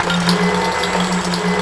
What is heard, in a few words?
WET, WATER; AKAI S6000 CD-ROM - Volume 1